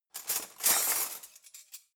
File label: cutlery knives drawer grab